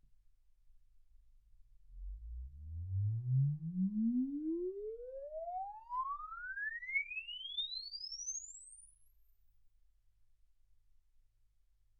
exp swept sinus 10Hz 24kHz

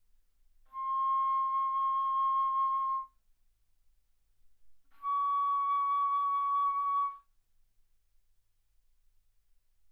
Bass Flute ff C6 Db6

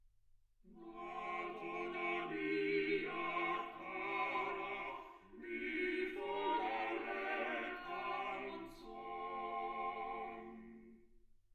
quartet

